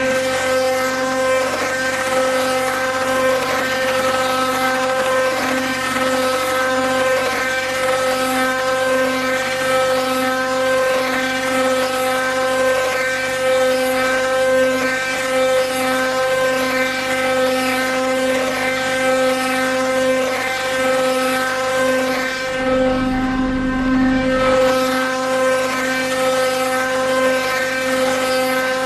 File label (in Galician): Audio Carro Cantando En Queixa